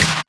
Claps & Snares

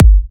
Bassdrum

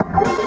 F STAB, funky; Loopmasters; Funky Underground Vol 2

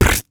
Human Beatbox, Beatbox Hits

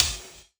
Hat, Dubstep One-Shot Drums

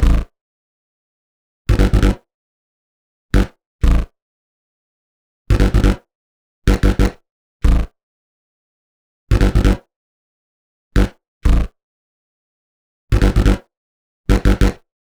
126 B#m Bass, Bpm126, Complex Electro Synths; Prime Loops; Biomass

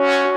Synth Shot F#; Mutekki Media; Vengeance Electroshock Vol.2

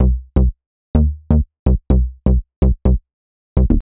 126 C BASS, Funky

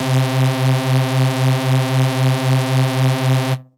Dirty Dutch Lead C3; Vengeance Electroshock Vol.2; VES2 Dirty Dutch Special; VES2 Dirty Dutch Leads

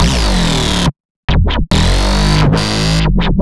140 A BASSLINE, Dubstep

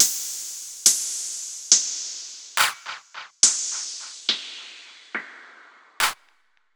Bpm140 Shade Perc FX Loop; Dubstep; FX Loops

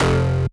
Bass Shot F

